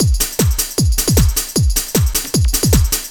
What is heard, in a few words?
LOOP, Eurotech; Sounds Good